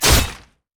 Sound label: basic attack claw; rengar